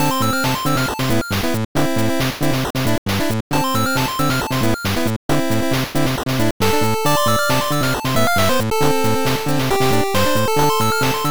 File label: 8bit Metro Pulse